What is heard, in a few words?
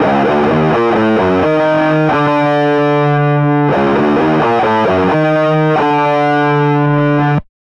Rock Guitar, mono guitar is mixed to the center of the stereo field; Recording One Rock Guitar; Zystrix